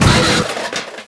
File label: track collide; Army Men TiS